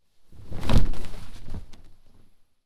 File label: clothes low hit drop